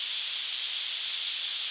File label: drum slider slide; AriizuCA's Skin V3.17.6 Tail Yellow